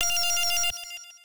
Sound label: chime; chime sharp